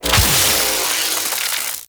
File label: Electricity Shock Burst Fire; Warframe; Corpus; Oxium Osprey